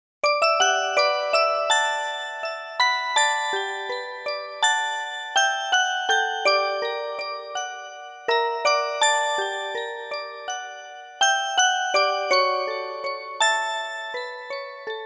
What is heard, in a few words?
Music Box; Kanon